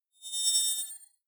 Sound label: hologram appear, energie